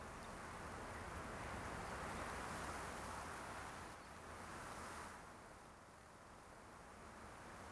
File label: wind light